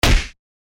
インパクト ピシ; 場面切り替えや心理描写; 演出効果音

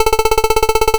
会話; nes retro talk sound effects

